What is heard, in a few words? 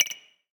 Ui Quests Button